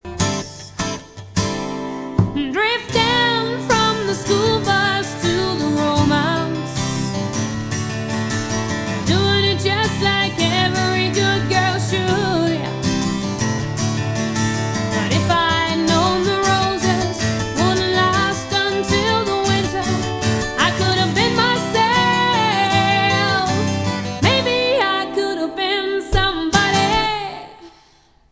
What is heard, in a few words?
acoustic